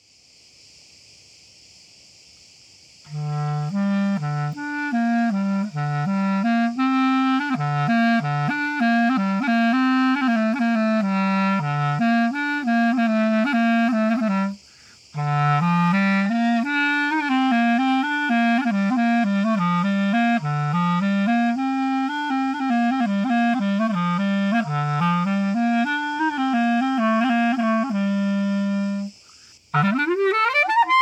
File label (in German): Standard Spiel